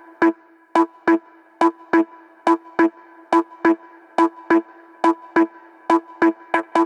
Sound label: 140BPM A; Vengeance - Essential Clubsounds Vol.4